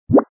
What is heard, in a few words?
efek icecream